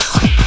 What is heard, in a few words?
COMBI, Houseworx; Ueberschall; Workz-9